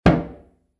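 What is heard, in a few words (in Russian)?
глухой удар деревянного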